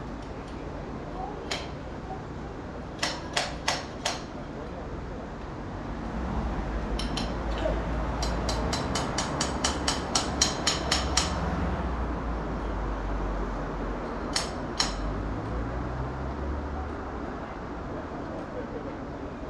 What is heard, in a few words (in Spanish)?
Martillazos; PlazaBeata